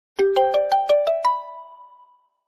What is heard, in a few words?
Talk Ring; LINE